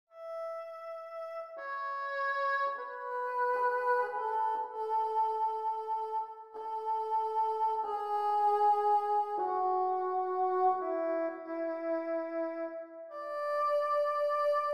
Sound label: Bassoon